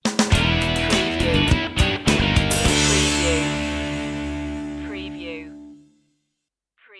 funk rock sting

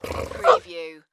Dog Growl Bark

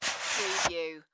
VHS Cover Remove

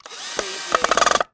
Electric Drill Tight; Sound Effects Bible

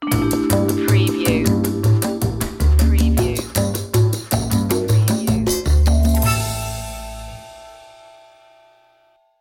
jazzy opener

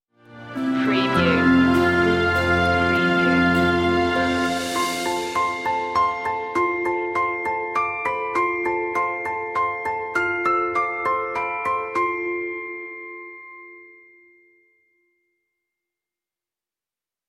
orchestral; away in a manger; media music now

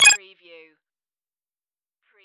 digipanel

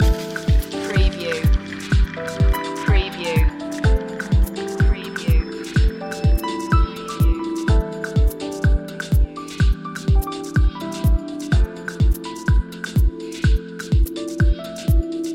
waterfall loop

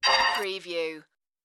digital slide glitch

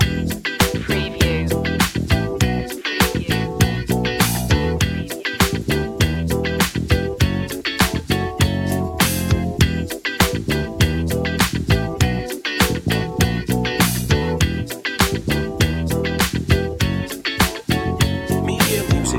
good vibe loop

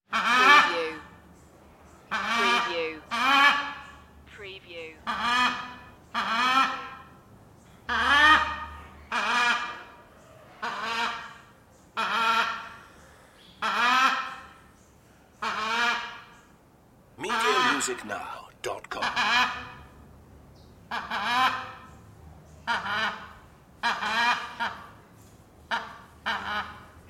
parrot

